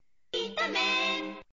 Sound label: jingle; itomen